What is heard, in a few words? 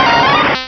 weepinbell, cries; pokeemerald